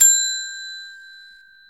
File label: desk bell service